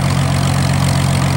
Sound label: Plane P40 Idle Loop; Airplanes